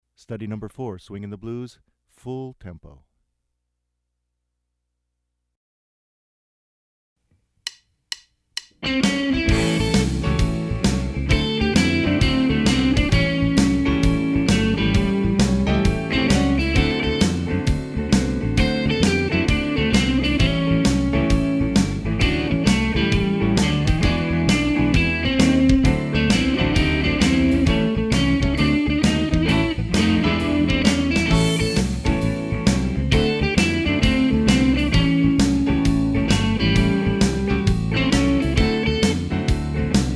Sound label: Fast Tempo